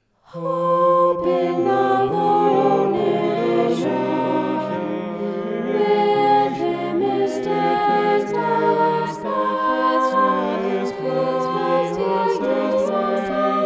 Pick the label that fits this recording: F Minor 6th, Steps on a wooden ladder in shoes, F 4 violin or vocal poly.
vocal poly